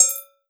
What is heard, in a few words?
Perc Kling, One Shots, Drums, Misc Percussion